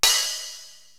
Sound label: HH Old Pop Open, Korg TR-Rack drum machine